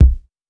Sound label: Hiphop kick